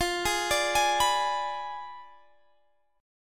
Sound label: F Diminished 7th F down Guitar Standard; Fdim7; arpeggiated; capo=2